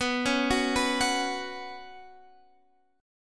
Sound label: B Suspended 2nd, Guitar, Standard; arpeggiated; JGuitar